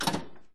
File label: stop